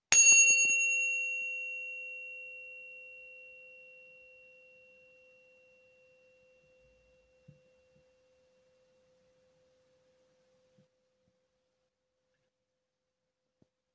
Chime sound; Meditation Zen Chime

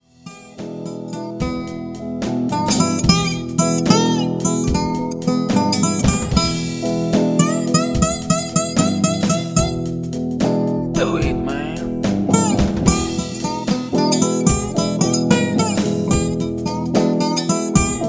canjo solo; Build a canjo

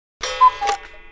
cuckoo clock single

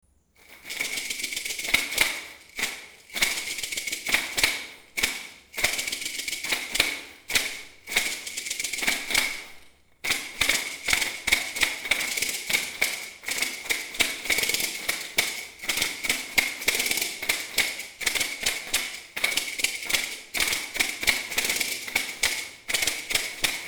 MARACA

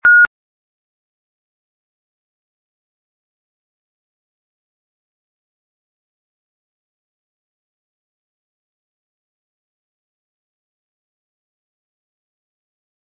FCC beep tone

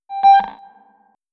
blip; Wall Ping Sensor

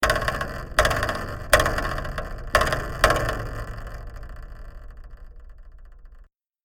Sloppy Spring, Spring Reverb; 3D-Printed Spring Reverb Microphone

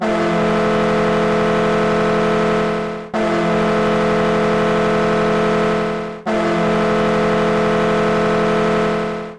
Goal Horn

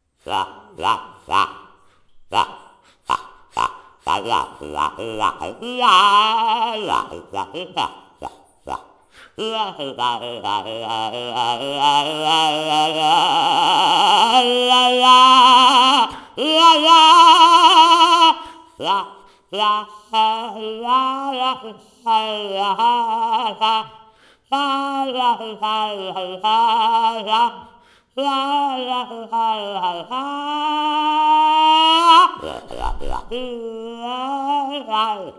TINY TOOTER RUBBER REED

